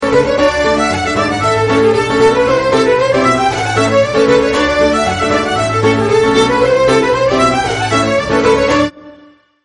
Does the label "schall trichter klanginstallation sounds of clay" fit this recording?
No